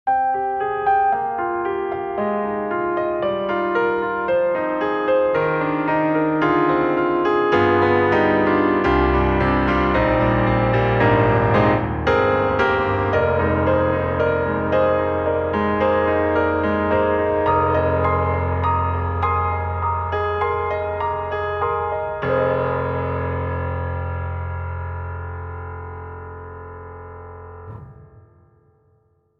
Rosewood Grand; Orange Tree Samples; Evolution Rosewood Grand; Pastels

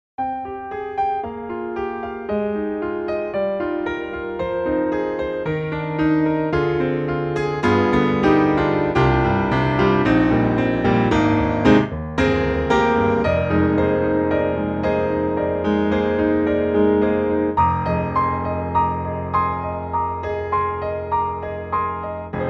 CFX CONCERT GRAND Classic Solo Piano; GARRITAN

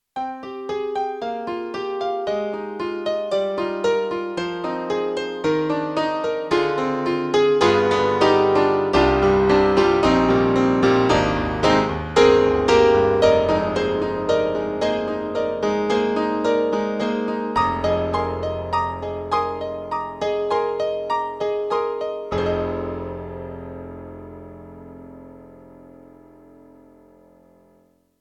YAMAHA MU1000EX, Stereo Grand

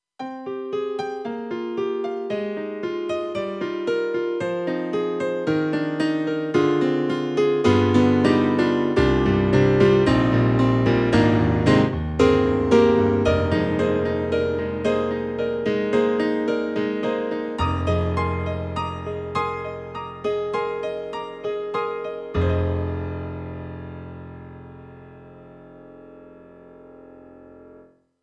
KORG M3 XPanded Stereo ConcertPiano2; M3 Version 1 Wave

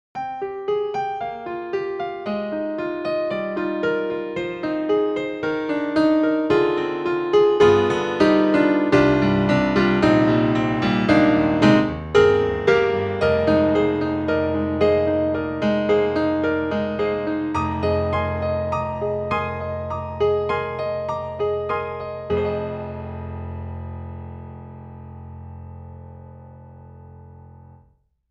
Italian Grand Rock The House; Synthogy; Ivory II

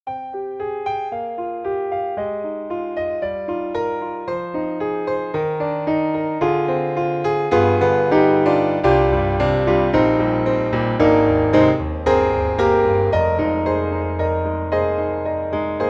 Mini Grand Default; AIR Music Technology

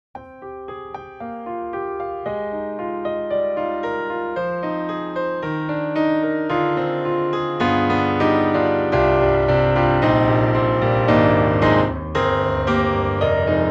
Rosewood Grand; Orange Tree Samples; Evolution Rosewood Grand

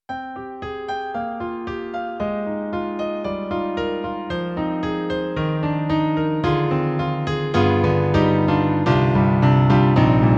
Piano; Roland; SonicCell; GM2

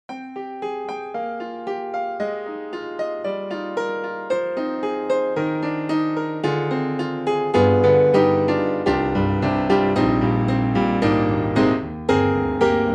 KORG KRONOS 2 Japanese Bright Grand

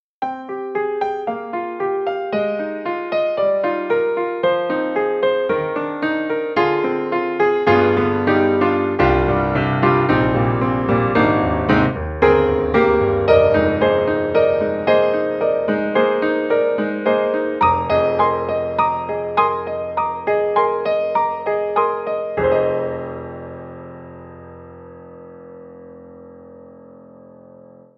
KORG KRONOS 2 Piano

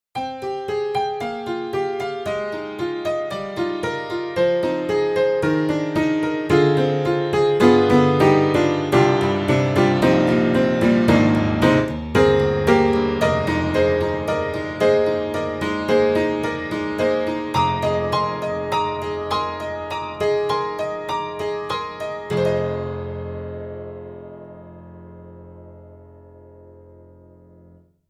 Upright Pianos Tack Piano Tacky Tunes; Synthogy; Ivory II

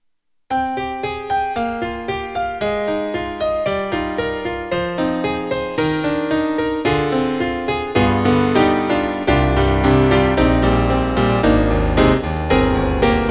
HARD PIANO; CASIO; GZ-50M

